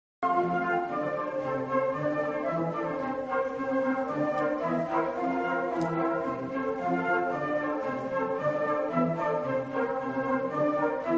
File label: concert, flute